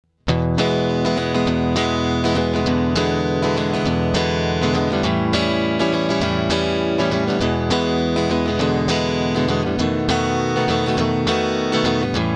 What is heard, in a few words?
blues prog